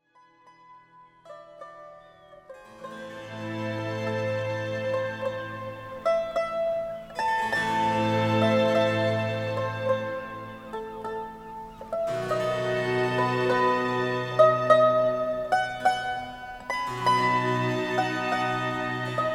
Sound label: Mandolin Concerto; VIVALDI